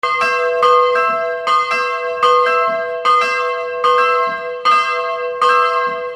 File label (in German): Gelaeut, Eisenhausen